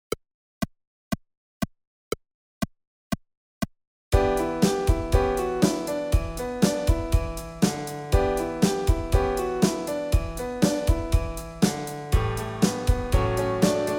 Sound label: Percussion Piano Mix